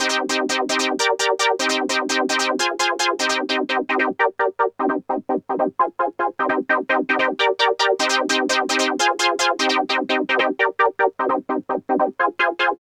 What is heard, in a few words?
FILTER TRUNK, 150; Masterbits - Tekkno Trax; SQ LOOP 150